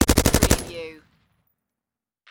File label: Machine Gun HKG36